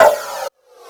FX Sounds, Drum Machines

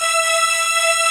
LINEAR SYNTH

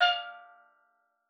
F4, STEELDRUMS; Xsample VOL-4

